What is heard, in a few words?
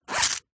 wallet unzip coin movement; foley; zapsplat